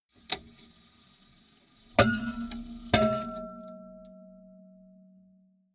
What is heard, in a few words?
clock strike